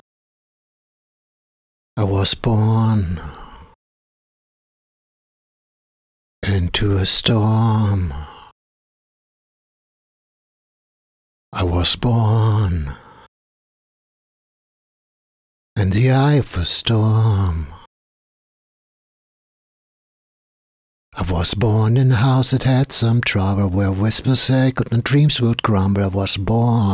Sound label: vox deep